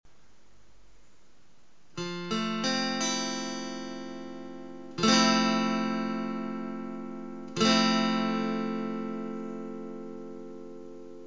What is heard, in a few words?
f-maj7, guitar